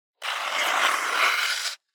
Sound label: skate Break; toontown-ceiling